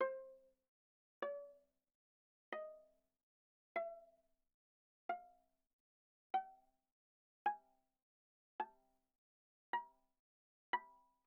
Viola pizz sul D mf C5 B5

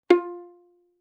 Violin pizz ff sul G F4; Strings

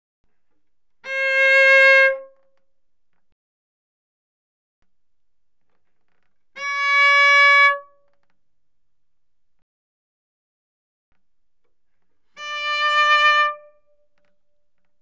Cello arco ff sul A C5Bb5; Strings

